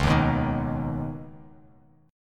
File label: C sharp 7th Suspended 2nd, C sharp, down; C#7sus2; strummed; JGuitar